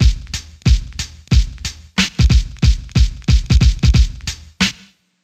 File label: Drum loops, Boombap